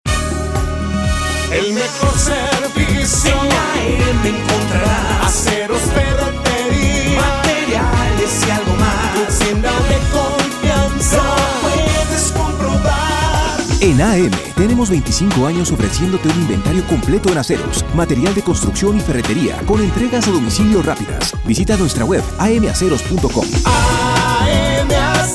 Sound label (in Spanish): Jingle; AMACEROS